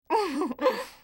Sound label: Laugh